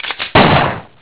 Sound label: fire